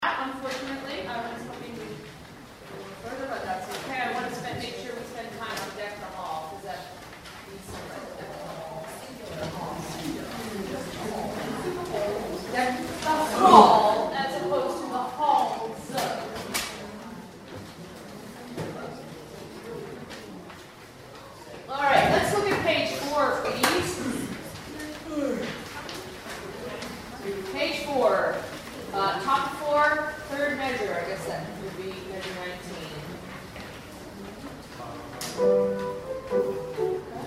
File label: deck hall